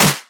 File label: dry clap single shot d key, Royality free hand clap sound tuned to the D note; single hits one shots